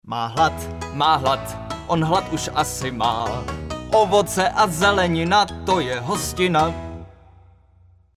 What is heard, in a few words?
Male Czech sing mix